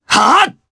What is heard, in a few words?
Attack, jp; Theo; kings raid